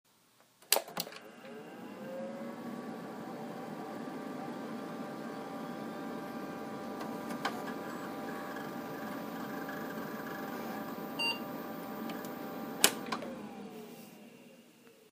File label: wd hdd